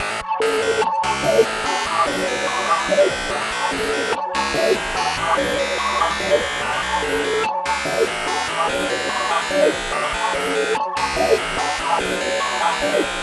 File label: 145 synth loop F min